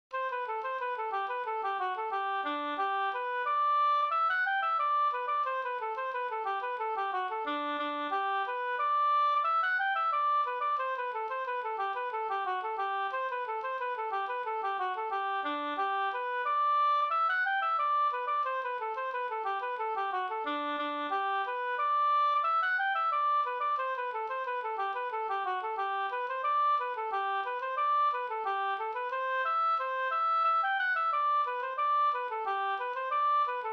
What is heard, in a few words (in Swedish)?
engelska